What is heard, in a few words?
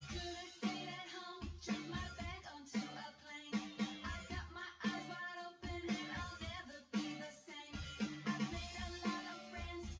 wdrums synthconv 250ms 1m src; SiSEC08; SiSEC underdetermined